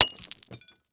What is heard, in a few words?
Crash Bowl Break On Cement; crashes; AdobeCC